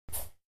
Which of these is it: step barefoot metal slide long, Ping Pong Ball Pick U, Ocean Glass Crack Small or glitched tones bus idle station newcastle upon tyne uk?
Ping Pong Ball Pick U